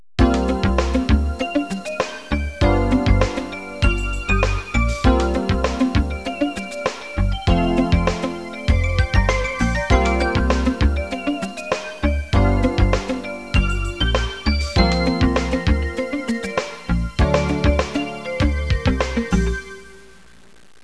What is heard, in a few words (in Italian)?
suonerie businessy